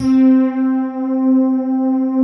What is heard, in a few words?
MUON PADS; Pro-Rec - Synthsonic